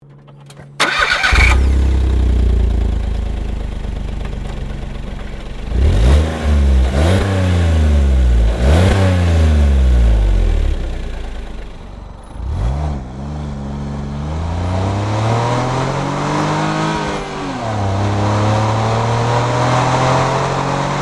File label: Smart Fortwo